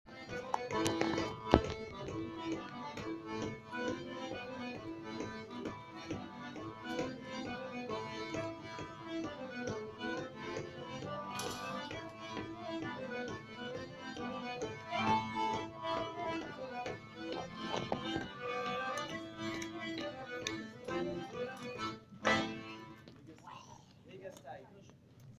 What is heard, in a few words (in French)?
Cercle irlandais; Musique d-ensemble; Cercle circassien